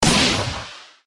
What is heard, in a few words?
shoot